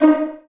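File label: Vio pizzicato D#3; Digital Sound Factory Virtuoso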